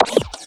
SFX, 180 BPM, Drum & Bass

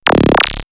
Warped, synthfx; X-Static Goldmine

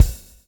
SWNG

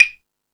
Latin Layers, drum machine

